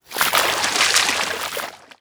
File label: arc gore; weapons; dota; pudge